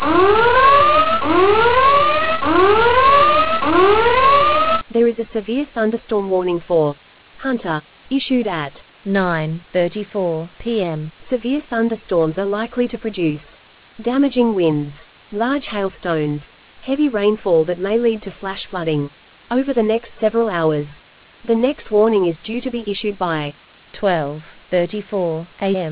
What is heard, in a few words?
storm audio